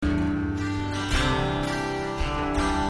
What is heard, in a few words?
Strummed G, C 82